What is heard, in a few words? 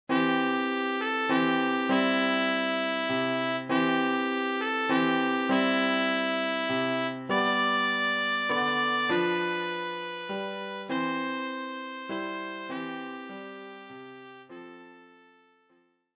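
trumpet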